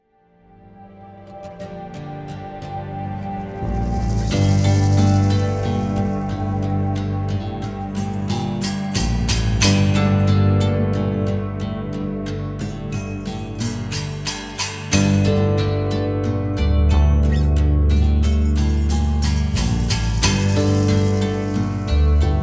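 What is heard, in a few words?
ambient guitar; the walking dead survival instinct gamerip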